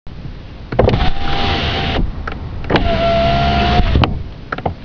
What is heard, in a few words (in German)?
fensterheber, defekte; Sound- und Geräuschdatenbank